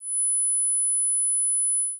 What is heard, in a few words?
10KHz; acustica